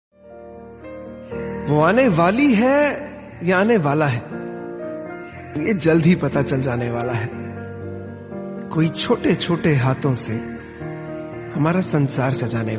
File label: islamic hindi song